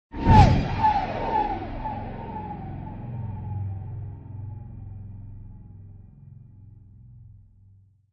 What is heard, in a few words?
Whoosh Alien; Whooshing sound for video editing, movements, logos, flash, or animation; Whooshes; Sound Design